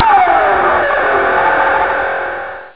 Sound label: guitar wail; jazz jackrabbit 2; M8